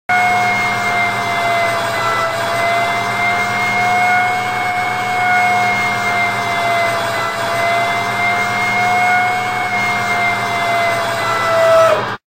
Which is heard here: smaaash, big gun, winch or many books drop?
winch